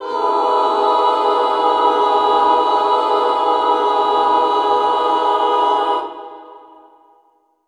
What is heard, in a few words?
CLS FEMALE, Classical Choir; Best Service; Extended Classical Choir; CLUSTER